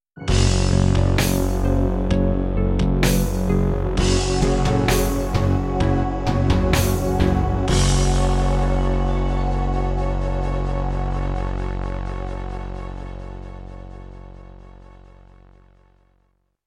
Kawai GMega; sigil doom episode 5

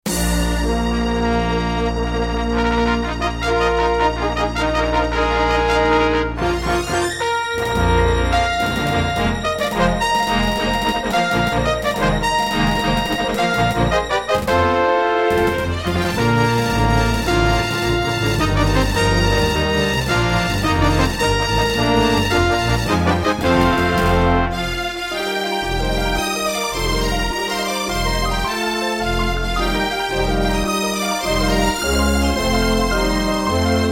Intro, Roland SCC-1; Dark Forces

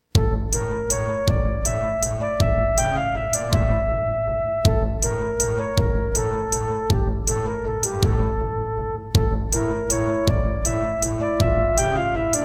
Serdaco Dreamblaster S2

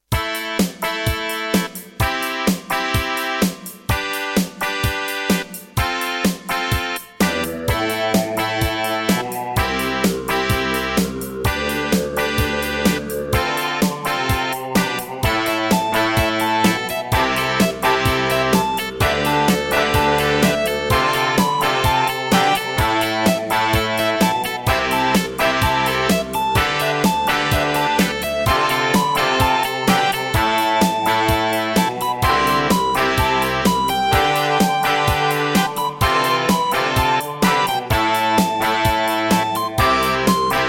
Serdaco Dreamblaster X1; raptor call of the shadows

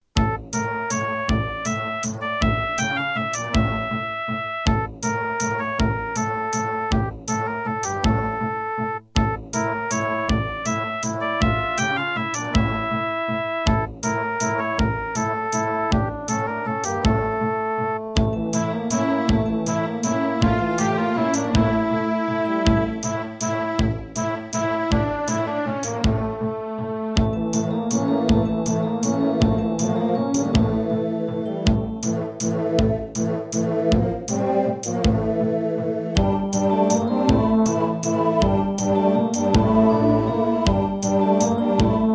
IBM Aptiva SW1C

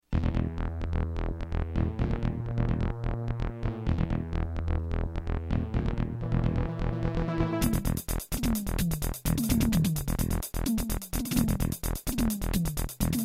Terratec MiniWaveSystem TTWT; Descent - Intro

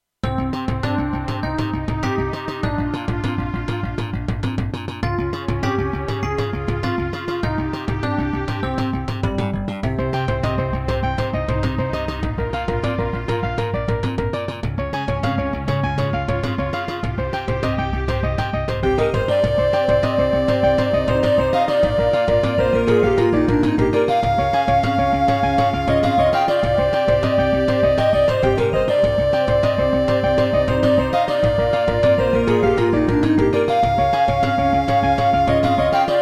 Gravis UltraSound Extreme; Hocus Pocus

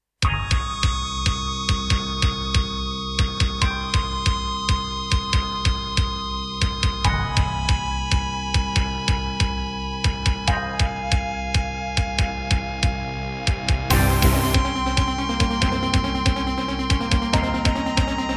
Serdaco Crystal Blaster C1; sigil doom episode 5

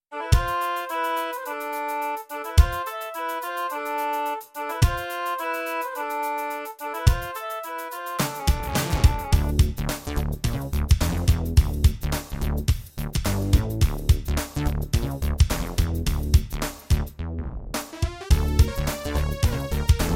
Egypt, Roland JV-1080; Lost Vikings

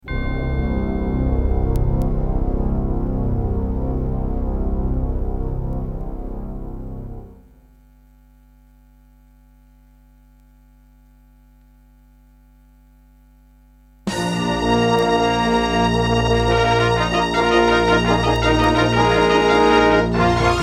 Intro, Turtle Beach Tropez; Dark Forces

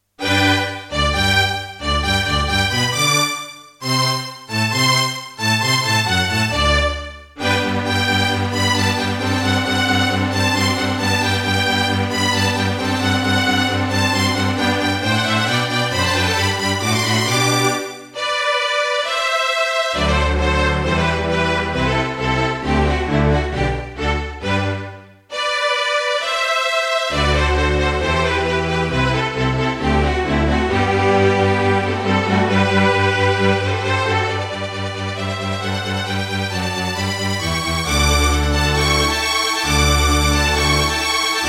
Soundfont; classical; Mozart; Eine Kleine Nachtmusik partial

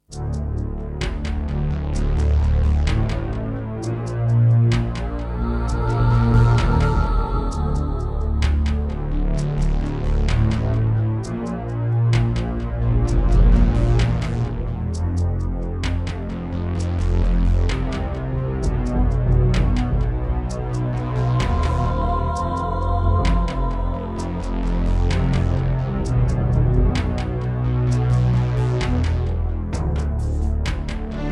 Yamaha DB51XG GSMODE; Descent II

